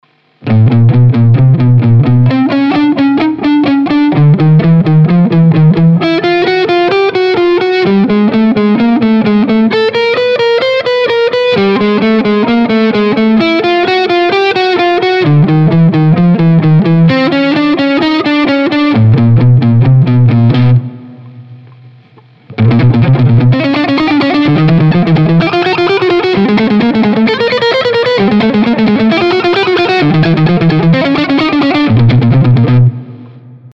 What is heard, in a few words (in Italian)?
Salto di due corde